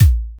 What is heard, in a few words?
impact clean